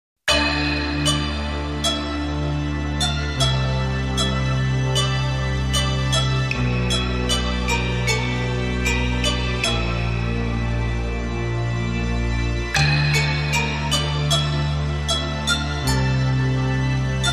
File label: Japanese Drums